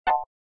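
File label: operating system Mac OS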